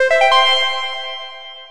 powerup, game sfx; anvil

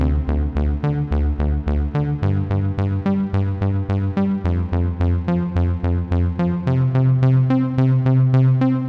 stem bass, music loop; rust

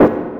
step, sfx; rust

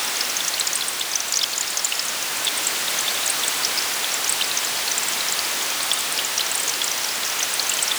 rain, ambience; prism

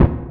hit, sfx; timber variations

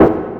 step, sfx; rust

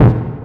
hit, sfx; rust

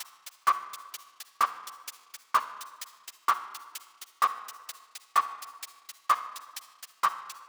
stem drums, music loop; vellum

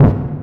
hit, sfx; rust

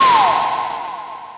ui close, game sfx; prism

